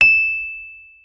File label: E7, Rhodes; Xsample VOL-1; 90 s Sample CDs